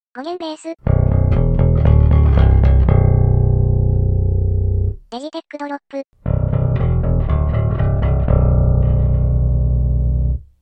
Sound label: bass